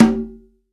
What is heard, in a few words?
CONGA H; STEREO CONGA; Cuba